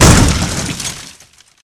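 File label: brute impact medium, vehicles; Dystopia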